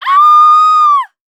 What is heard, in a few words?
Horror Scary Human Female Screaming